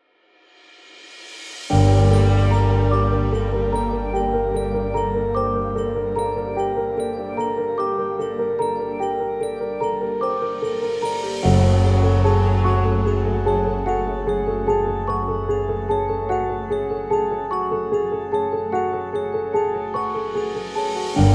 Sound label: music mystical halloween background